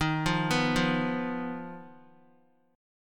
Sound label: D sharp Suspended 2nd Sharp 5th D sharp, Guitar, chord; arpeggiated; JGuitar